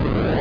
shoot

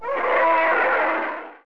gorilla die; Mir2; OnlineGame

